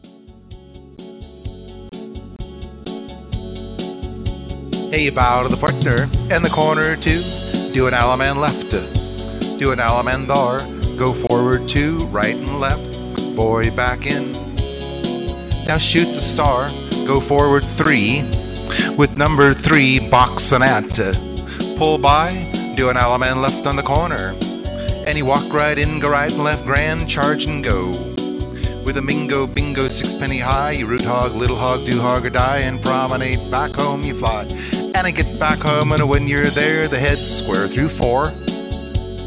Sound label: Patter